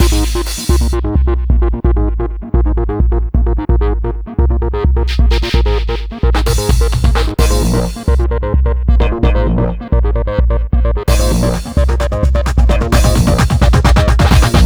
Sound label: Pluck